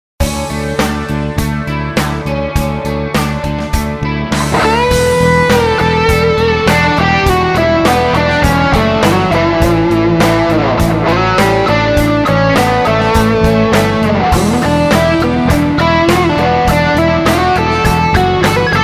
Cel Gold 10 1x10

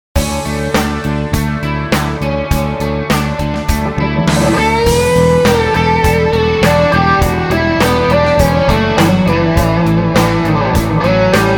Vintage 30 2x12

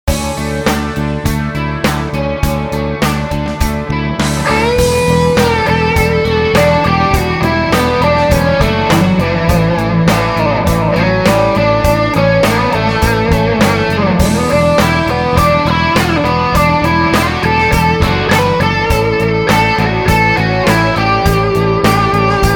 G12H Anniversary 2x12

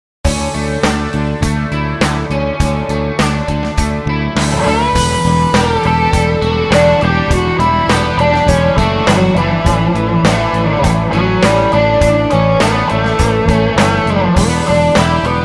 G10 Greenback 30w 2x10

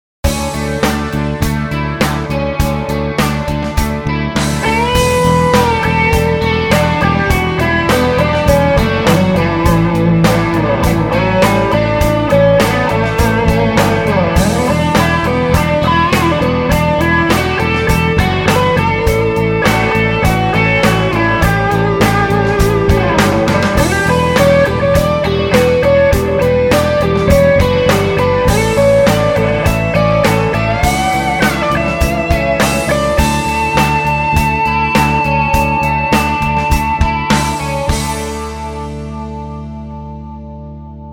G12 65 Heritage 2x12

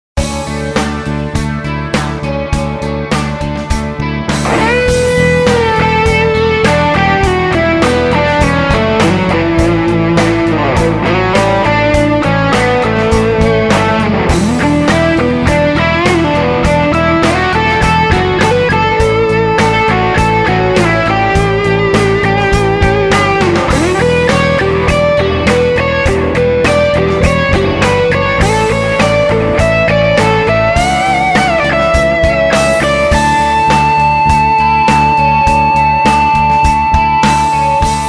Weber Cer 12 F150 1x12

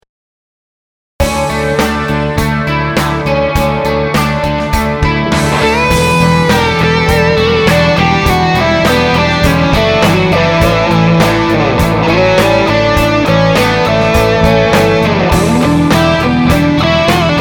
Harma Alnico Red Ruby

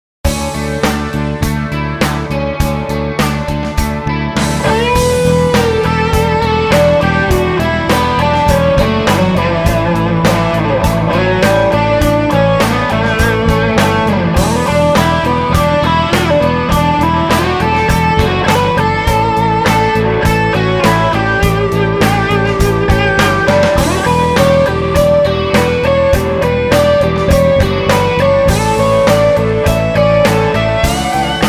Blue 2x12

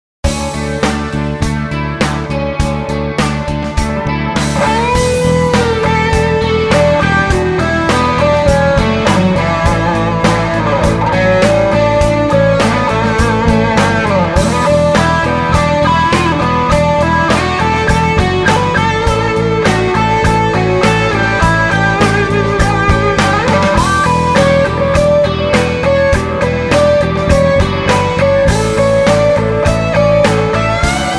Jensen C12N 2x12

